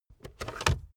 car backseat table fold in fast hard

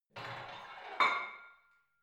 barbell plate put on hit ring reverby; sport freizeit; fitnessstudio

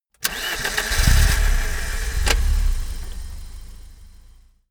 car car start

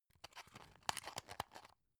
drug close soft; berufe; arzt

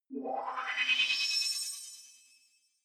sci-fi transmission incoming; swooshes-swishes

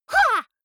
female fight shout attack short; vocals; comedy-cartoon; soundpool

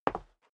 step dress boots concrete single stair down; menschen; schritte

